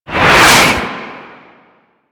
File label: Tank Cannon Projectile Whiz; Enemies; Weapons; Warframe; Lasrian